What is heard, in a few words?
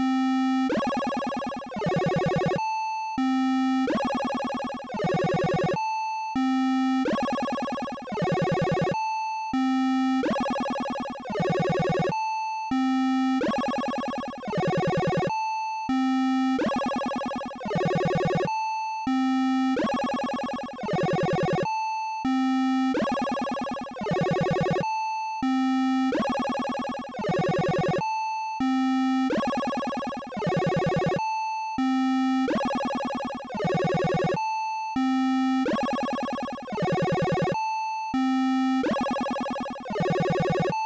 cool song beet box